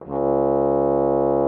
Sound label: FR HORN C#2; Xsample VOL-3